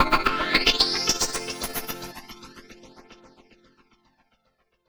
Techno Echo Arp, Arpeggios & Sequences; Dr. Fink's Funk Factory

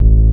bass sub; Ueberschall; Techno Trance Essentials

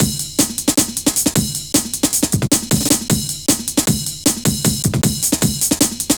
drum loops, jungle; Jungle Warfare 1&2

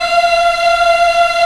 VOX African; Roland LCDP11 Africa VOL-1

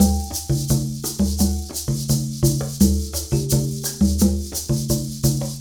Combo, Essential Percussion

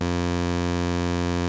Prophet 5 F3; Hollywood Edge - Giorgio Moroder Rare Synthesizer Collection

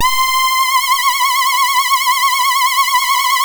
Synth and Organ

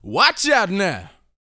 VOCAL F; ILIO

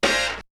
Hit; Hits; ZERO G - Datafile DISC 1

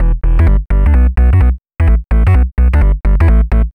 Bass, uk garage, 128bpm

